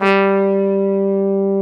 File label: trumpet G2